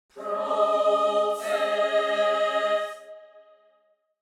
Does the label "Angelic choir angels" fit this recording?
Yes